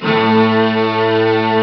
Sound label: string ensemble, Amiga Soundtracker Sample Packs; ST-XX Amiga Soundtracker Sample Packs